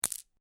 Marble Impact With Several Marbles

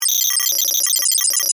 Data Beeps Readout, Scifi; Tech; Blast Wave

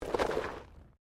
Body movement Slide Gravel

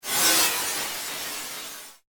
White Noise Laser; Static

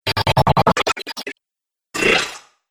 Dubstep Scifi Effects

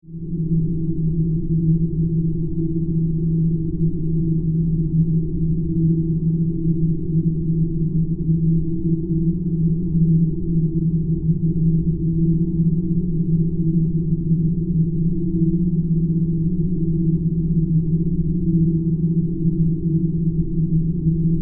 Drone Hollow Steady; Production Element; Drones; Sound Design; BlastWave

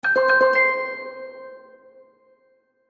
Magic Purchase Ding; Cartoon Sound Design

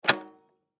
Drum Snare; Snare Drum 14 Inch Throw Off Turn Off; Drum Kit Sound Effects

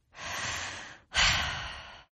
Sigh Female; Exhaling; Voice

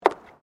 FOOTSTEPS WOMENS HIGH HEELS BOARDWALK STEP

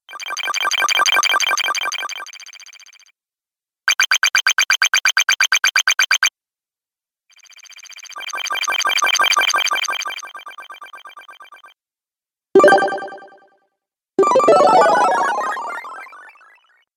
Retro Videogame Fx 5 Sounds Pack

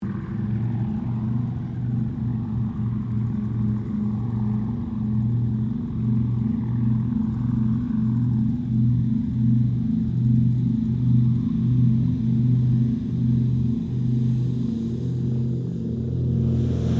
AIRCRAFT DOUGLAS DC3 TAKEOFF; Airplanes; Vehicles; Qantas 1942 DC3

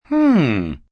"Hmm" React, male; Reaction; Exclamation; VoiceBox

